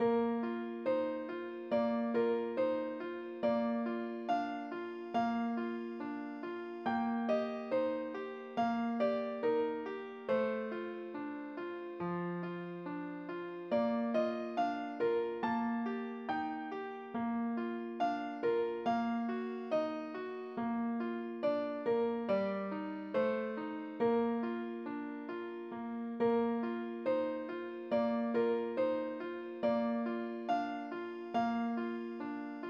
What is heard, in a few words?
piano slow; slow tempo; PlayScore version